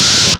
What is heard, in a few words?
FIRE SNR, PHUZZ DRUMS; Spectrasonic Distorted Reality 1